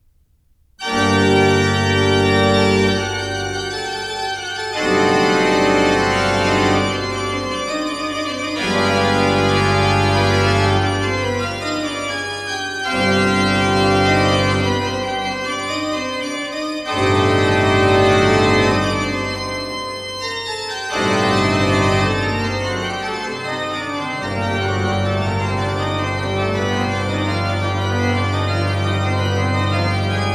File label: Fantasia Fugue in G minor, Great Organ; BWV542 Great - I. Fantasia; Bach; Bach Great Organ Works; Johann Sebastian Bach